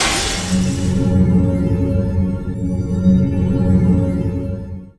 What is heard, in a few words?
warpgate open; Tanks